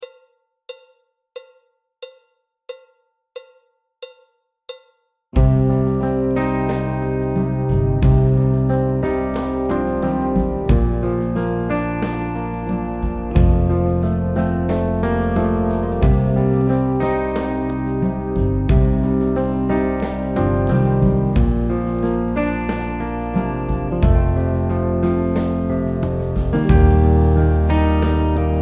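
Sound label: backing track, Jazz; Jazz Syllabus Level 1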